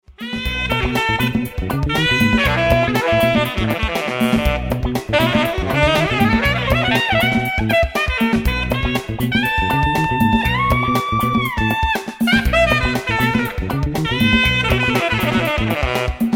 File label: Funk; Maraduke Jazz